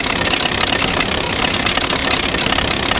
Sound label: t44 25kmh, treads; treads mt; World of Tanks Blitz